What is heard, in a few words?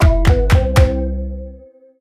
Instrumental